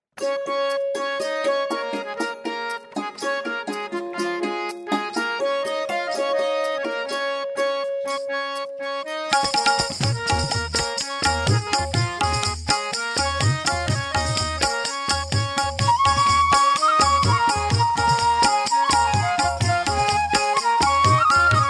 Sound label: Ladies Karaoke C Sharp Pancham; Shivaya Namah Shiva